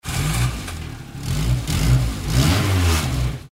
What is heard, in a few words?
route66 intro outro sfx